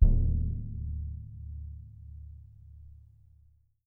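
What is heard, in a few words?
BASS DRUM, Percussion; Miroslav Vitous - Percussion & Harp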